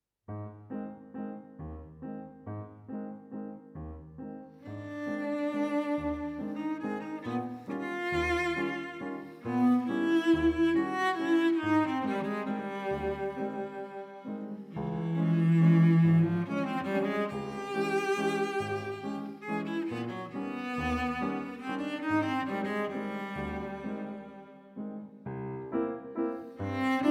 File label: Prelude for Cello and Piano